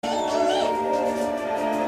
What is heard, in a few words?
screech; Hedwig